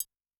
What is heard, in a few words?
Tri Mut, Yamaha DTXpress-II, Perc